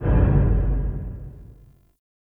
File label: Low Mech; Ambience; Warframe; Nokko Colony